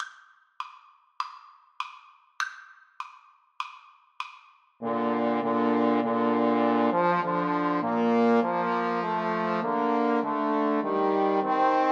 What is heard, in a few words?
trombone trio